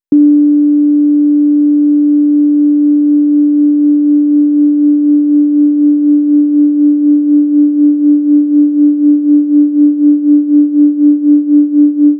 LFO AMP TREMOLO example; LANDR

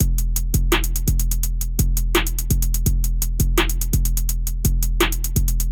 Eight Note Triples

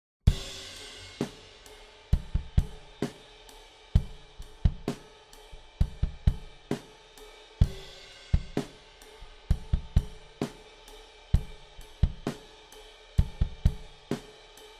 drum replacement dry

